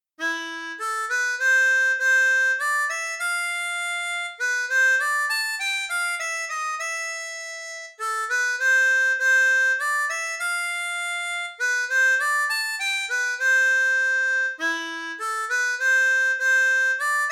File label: Valse En La Mineur, harmonica; Chopin